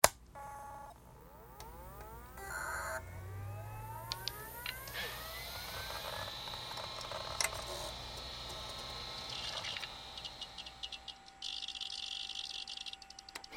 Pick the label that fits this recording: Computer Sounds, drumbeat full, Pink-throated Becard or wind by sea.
Computer Sounds